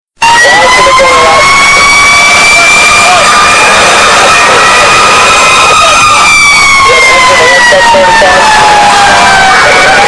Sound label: Long Ambulance Siren Ringtone